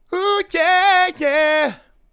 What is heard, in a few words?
"Uh Yeah Yaah", vocal phrases; Ultimate Vocals